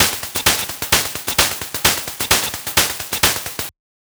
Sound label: Top Sample Saturated